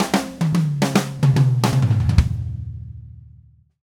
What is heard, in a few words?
Red Maple Groove 110, DW Red Maple Kit